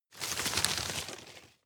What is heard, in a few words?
rustle medium short; foerster